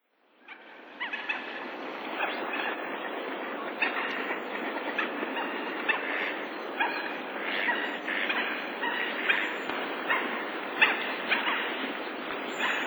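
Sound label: mandarin duck, nasu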